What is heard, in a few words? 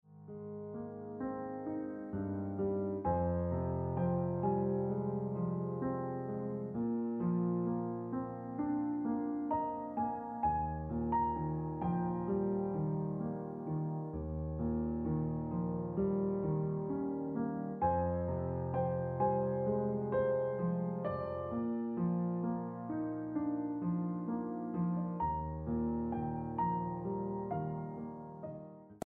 Gentle Ringtone Piano Music